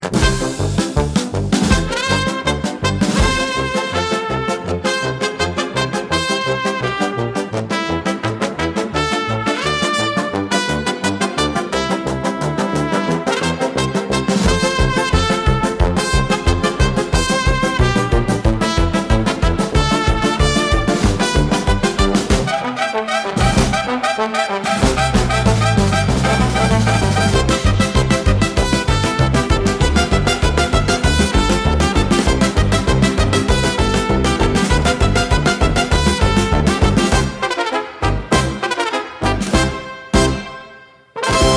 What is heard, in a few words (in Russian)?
канкан, Jazz for piano